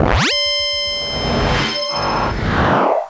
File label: WARP OUT; Heroine Iysayana Trilogy